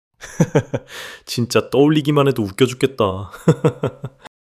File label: laughter